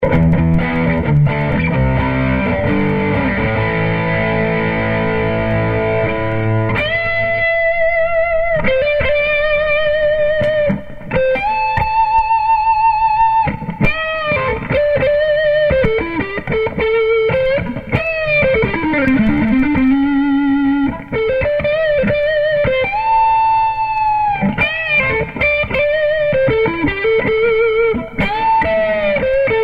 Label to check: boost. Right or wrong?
Right